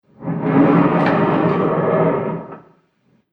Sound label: Secret Lair Sliding Door; Sliding Door Sound Effects